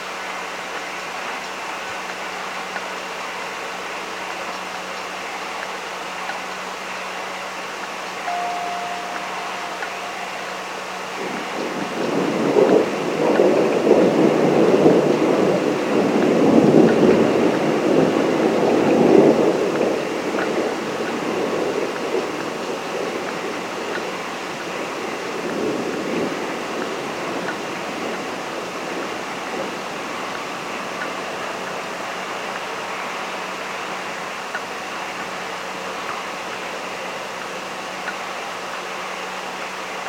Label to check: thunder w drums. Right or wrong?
Right